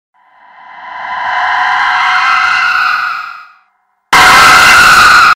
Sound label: scary ghost scream horror sound effect